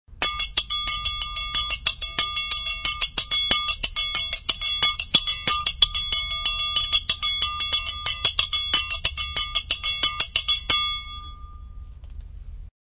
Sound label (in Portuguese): Triangulo